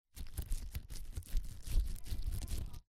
toy

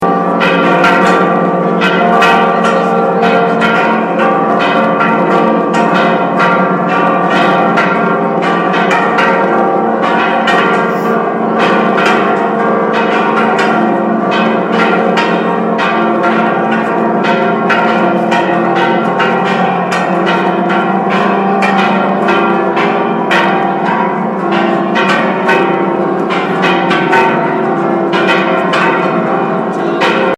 Mexico zocalo bells

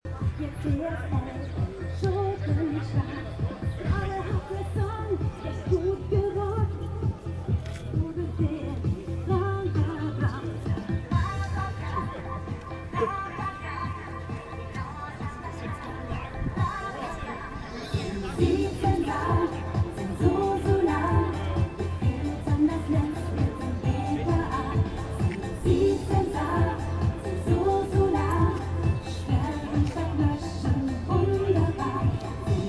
song playing at demonstration in Berlin; zensursula; against zensursula

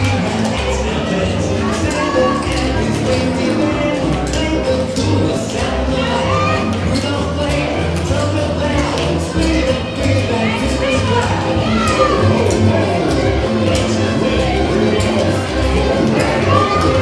mad tapping at the mall

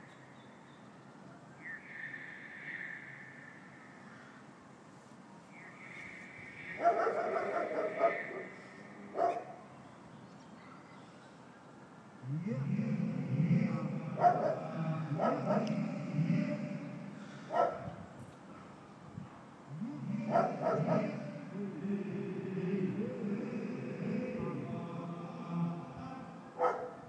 sound check

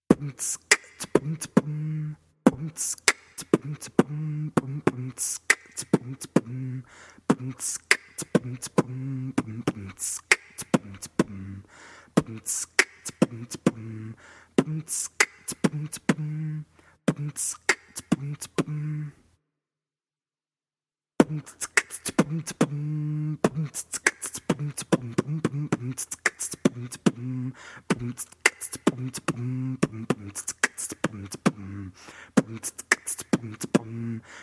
Langeweile beatbox

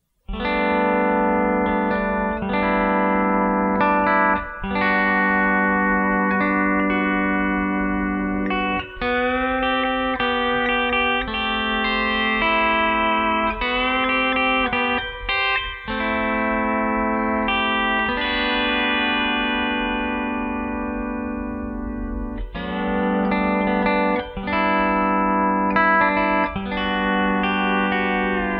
just a little steel guitar

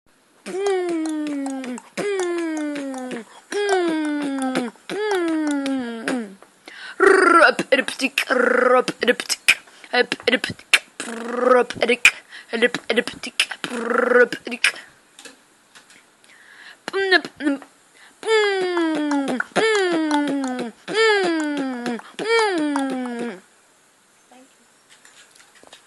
beatboxing

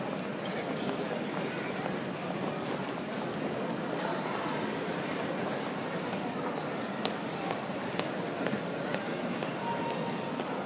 london bridge overground station evening ambience

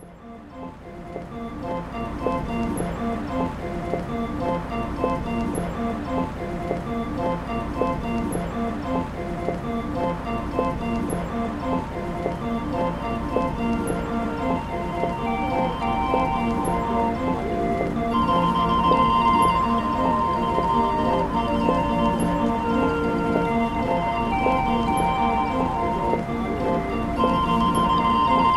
the organ grinder of hamburg remixed

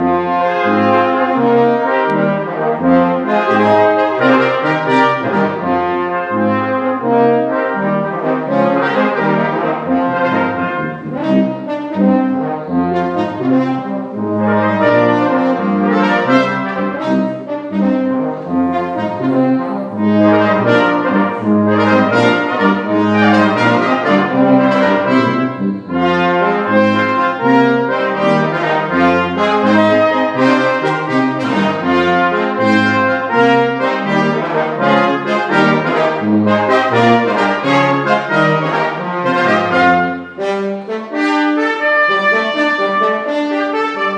brass quintet

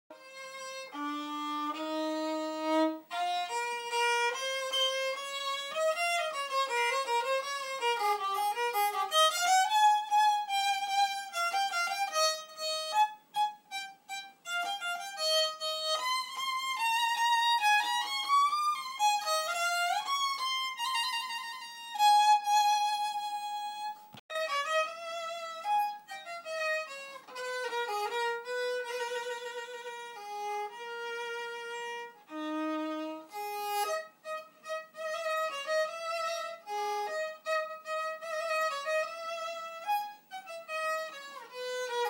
playing 3dprinted violin first time

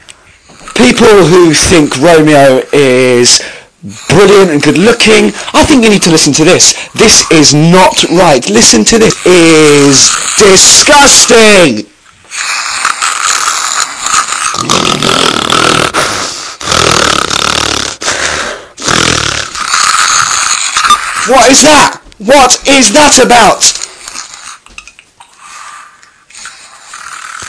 horn

